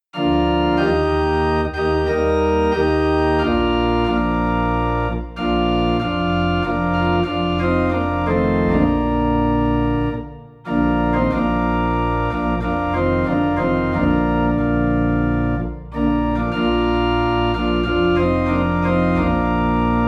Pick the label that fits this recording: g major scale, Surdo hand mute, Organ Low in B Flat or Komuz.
Organ Low in B Flat